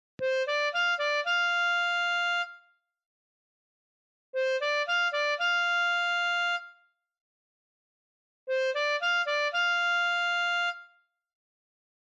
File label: Blues Harmo No Swing